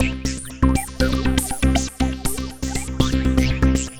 Synth

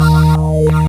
SP synth, SP1200; E-MU Producer Series Vol. 8 – Platinum Phatt

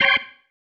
Stab, Organ, One Shots; Sonic Foundry (Sony Creative Software); Joe Vitale Organ Donor

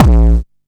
Kick, Drum & Bass; AMG - Prototype Drum & Bass

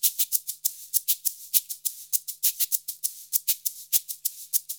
Modern Percussion Loops; Soundscan vol.56; AKAI

